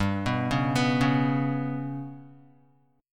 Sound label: G Major Flat 5th, down, Guitar; GMb5 arpeggiated; JGuitar